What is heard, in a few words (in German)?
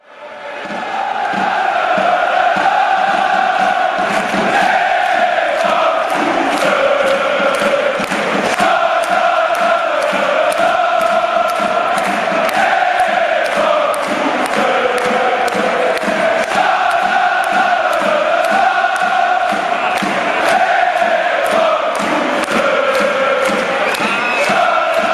torhymne fangesaenge; bayer 04 leverkusen